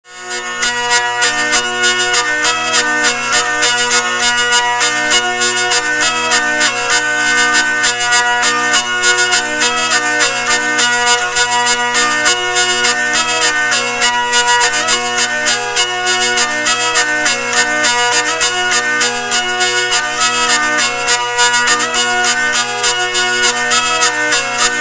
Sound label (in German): oktav mit, vielle; AN OLD LOOKING GUITAR SHAPE